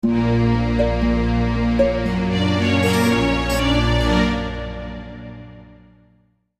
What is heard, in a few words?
Lose Combat